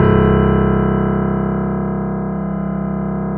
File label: C 0, Keyboards Acoustic, GRANDP ST; USB Soundscan vol.17